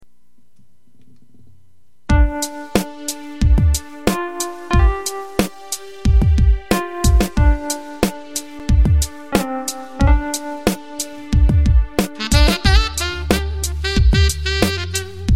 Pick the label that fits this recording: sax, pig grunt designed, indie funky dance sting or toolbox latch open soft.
sax